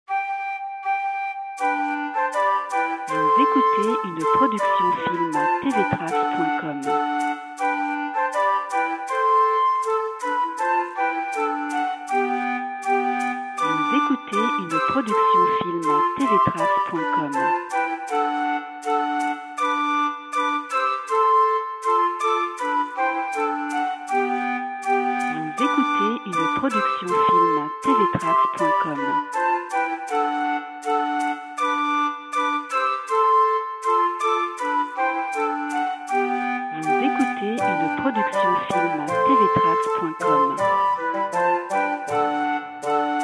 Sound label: Bouffon; Pierre PHALESE; Filmtv-tracks